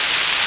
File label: Crowd, Super Tennis; SNES